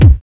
BD

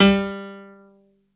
H-PNO G2

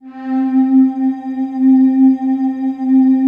PAD, ATMO PADS; Best Service; XX Large

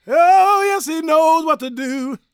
GOSP MALE, Vocal Planet Gospel

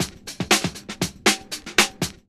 LOOP, DRUM; E-Lab; X Static Goldmine 2; akai

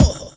BD VOX; E-Lab; X Static Goldmine 2; akai

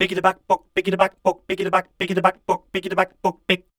SCAT #F, Voice

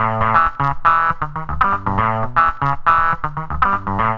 GTR LOP; E-Lab; X Static Goldmine 2; akai

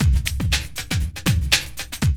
LOOP; E-Lab; X Static Goldmine 2

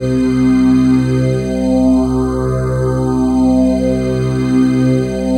PHASE PAD, ATMO PADS; Best Service; XX Large

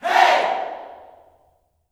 HEY, Vocal Planet Gospel YELL SHOUT; Spectrasonics; Vocal Planet Vol.1 Gospel; akai

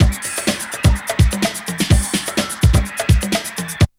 BREAK

